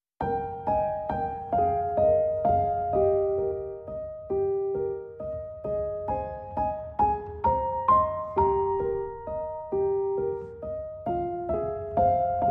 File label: Piano Solo